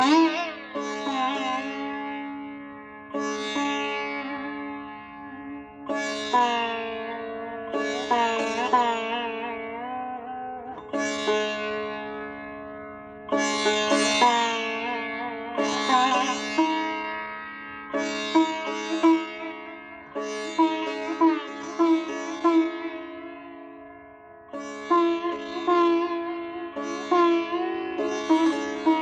Sitar; Darbar

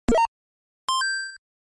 Kawaii beep

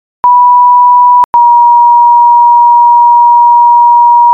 old tone; Montreal

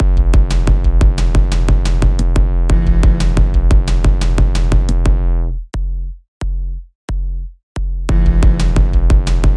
Tekno beat

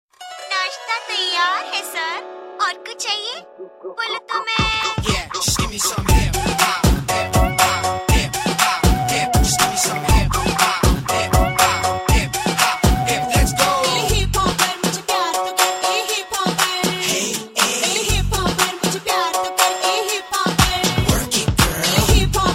Hip Hopper Remix

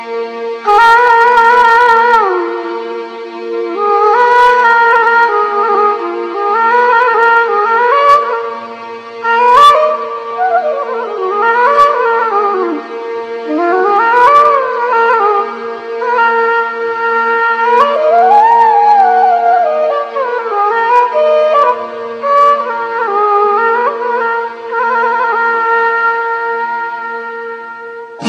Arabic Flute

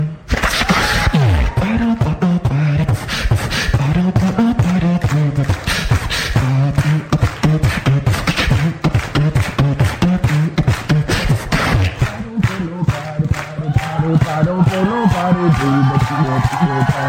beatbox

